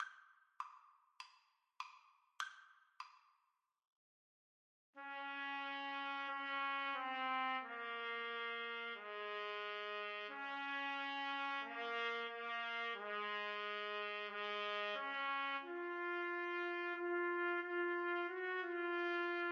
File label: trumpet duet